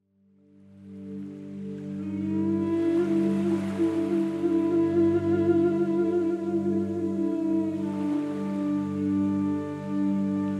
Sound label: Buddhist flute; فلوت بودایی